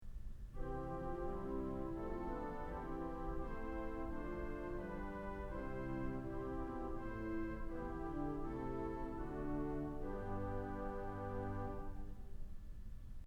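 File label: Voix Celeste, organ stops; Kellogg Auditorium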